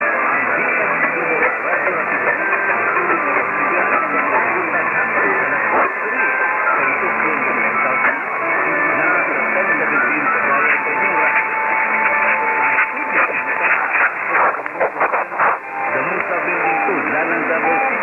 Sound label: 1017 kHz; DXRR